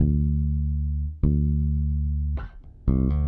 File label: Electric Bass; Basses